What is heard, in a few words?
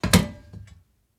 BF Metallic Thud; Fairlight Sound Lib